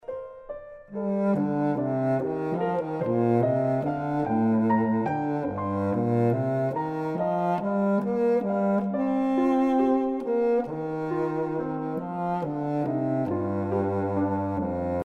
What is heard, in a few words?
Andante, Big Bassoon